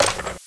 556 ar clip miss

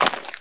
mud

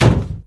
glass bump

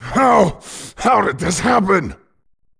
death; cooler

